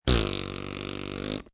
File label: Mouth effects, raspberry (human voice); Talking Sound Effects